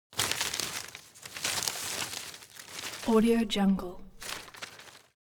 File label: foley leaves rustle hard; smartsoundfx